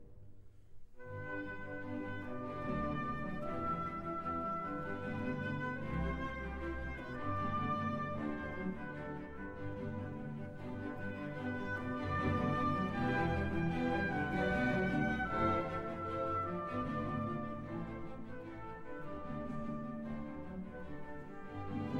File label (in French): mélodie du hautbois; Symphony No. 8 in G Major, Op. 88, III. Allegretto Grazioso - Molto Vi